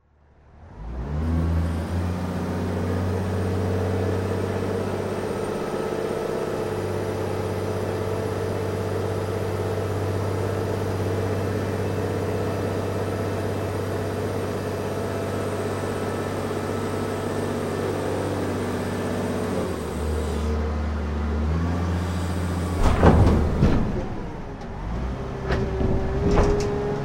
INDUSTRY CONTAINER YARD

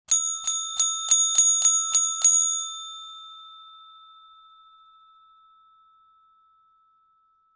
BELL DESK

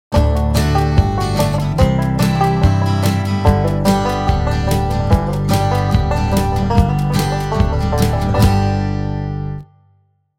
Going Camping STING

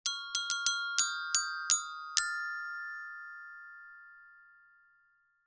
PERCUSSION ORCHESTRA BELLS

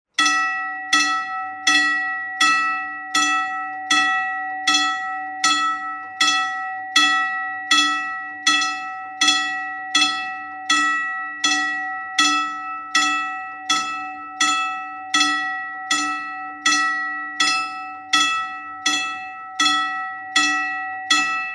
TRAIN CROSSING BELL

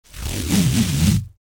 STRAP

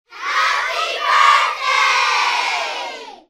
CROWD REACTION

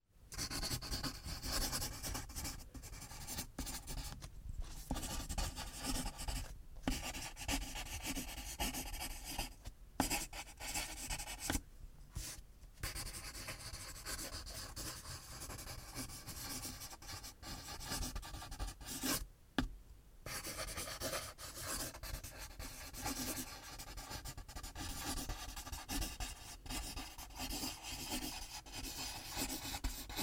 Writing Pencil

